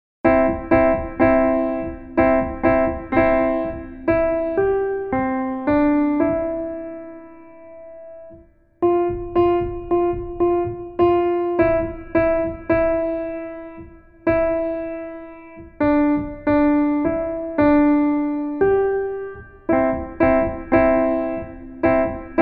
Jingle Bells

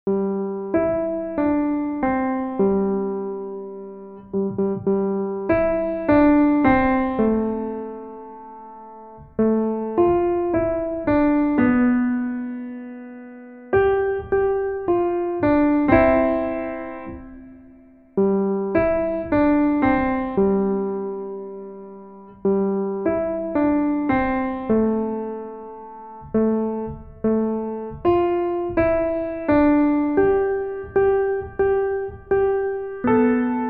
Jingle Bells, student recordings

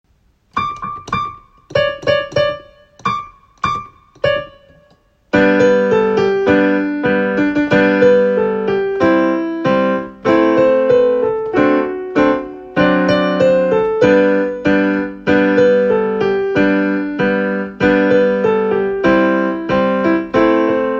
JingleBells Home Recorded

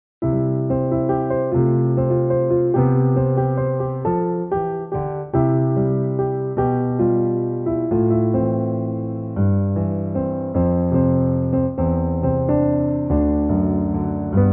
PianoMan

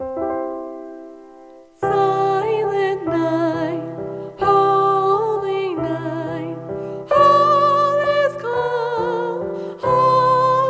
Silent Night Vocals

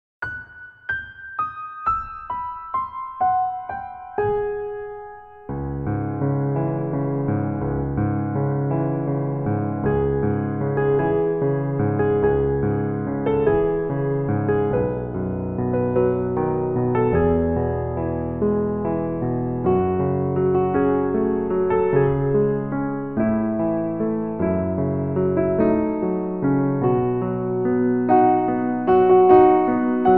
Piano Solo; From Corpse Bride

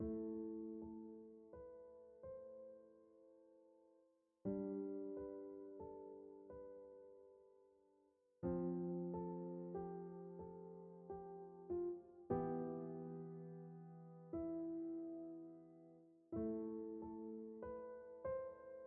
Flute

